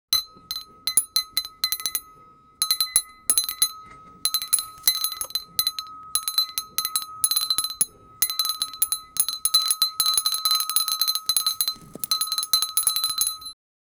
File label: ice cream man coming with, bell